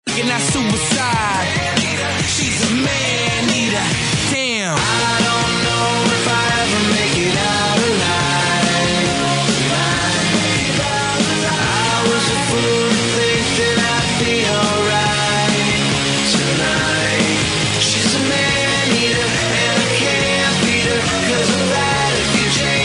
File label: Cut Dance Music